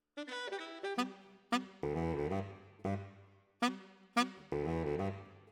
Tenor, RNR for piano

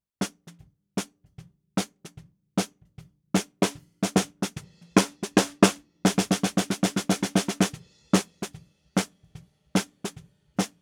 SNR BTM

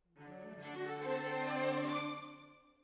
Decca, Jazz for piano

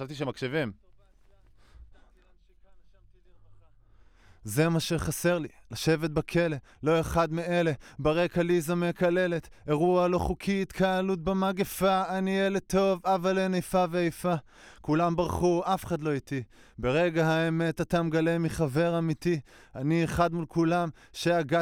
Voc

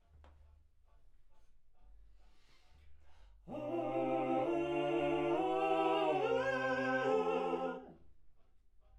Backs AKG LEFT

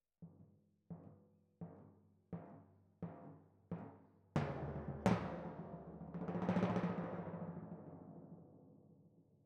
Timp Close

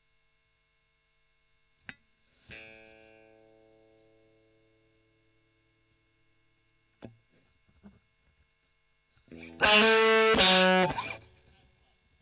E.GTR OD; Gefell

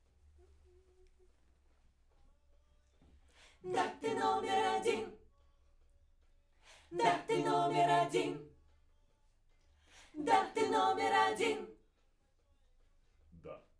Backs; SCHOEPS

